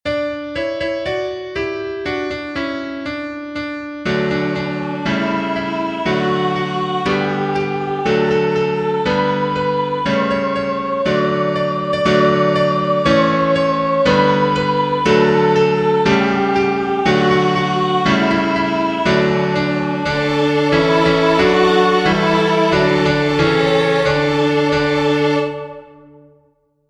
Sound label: BackingTrack